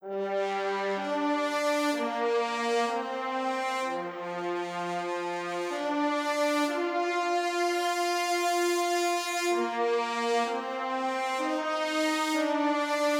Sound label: no strings no vox cinematic; gerilim; By Burak Sound Bank; tension vol 52